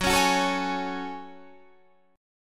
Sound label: F sharp Minor Add 9th F sharp; strummed